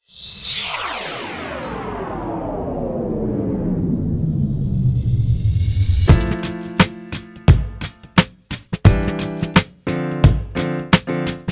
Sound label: Jazz Clubbing; SHORT BUS